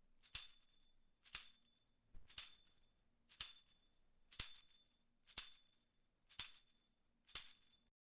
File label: Duet Tamb